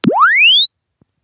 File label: Press Two Sides Chirp; Samsung 4 Recordings